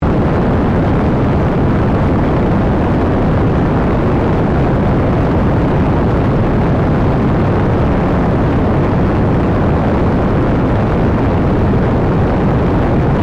Rocket Booster Loop, Scifi, Rocket, Booster, Loop, Flame, Science, Fiction; Spacecrafts